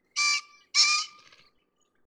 kiji

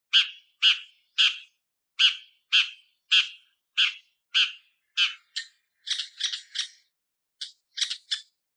akamozu; さえずり; 全長 20cm 渡り区分 夏鳥

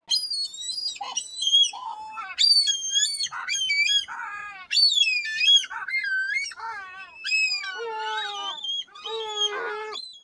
oomizunagidori, bird

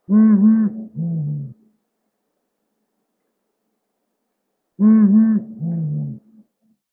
shimahukurou

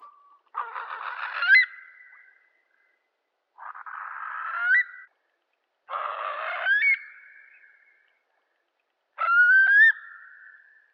daishakushigi

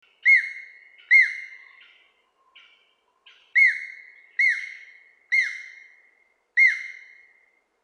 aogera

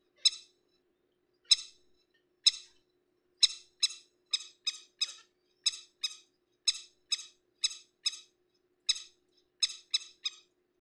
yamasemi

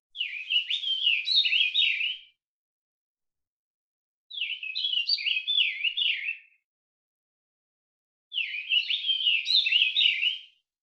meguro; さえずり; 全長 13.5cm 渡り区分 留鳥

